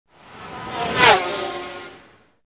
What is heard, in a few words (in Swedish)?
carby, racerbil